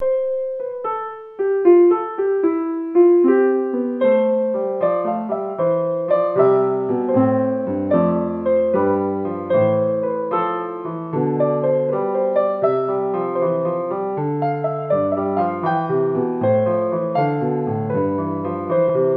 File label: Polyphonic Piece